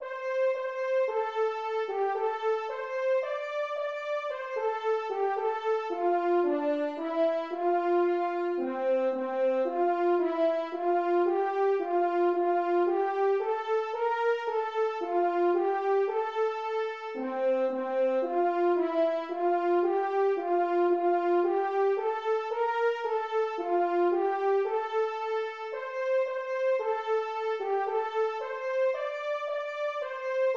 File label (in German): sopran